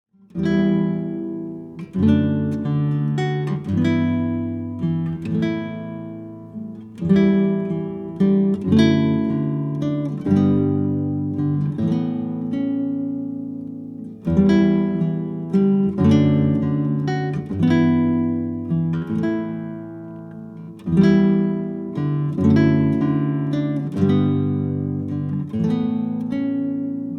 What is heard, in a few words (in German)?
Instrumental